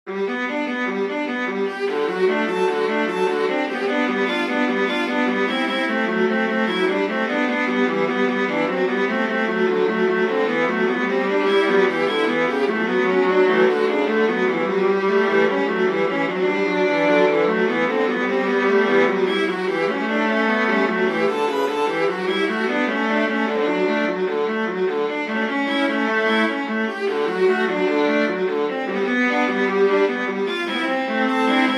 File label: invention g major for viola duet; bach johann sebastian